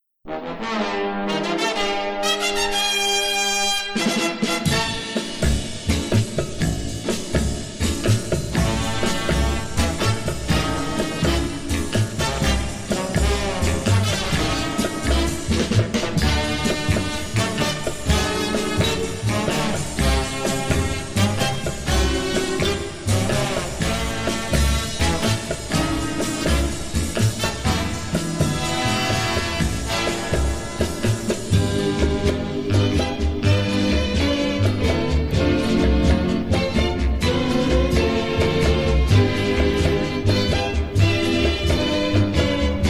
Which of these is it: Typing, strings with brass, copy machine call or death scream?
strings with brass